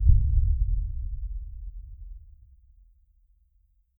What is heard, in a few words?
a mellow and distant shot, shotgun